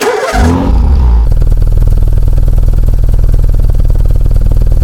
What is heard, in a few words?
atv start loop, vehicles; hl2